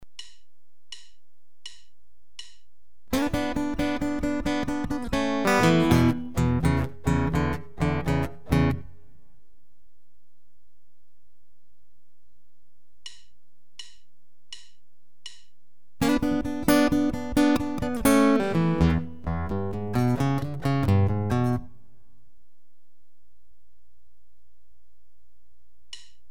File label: Alternating between lead and bass, guitar